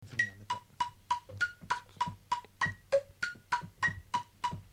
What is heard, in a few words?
CLick